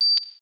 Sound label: tech oneshot, office